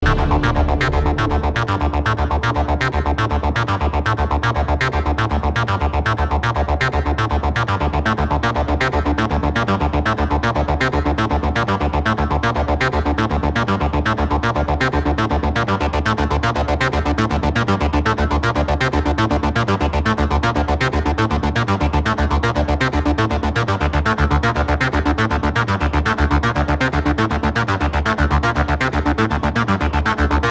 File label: remix ringtone